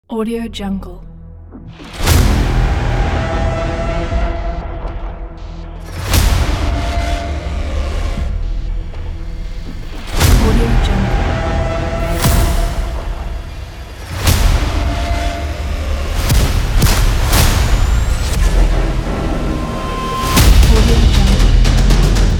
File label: the epic drum